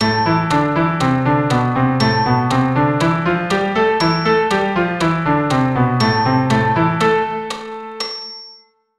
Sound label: Am natural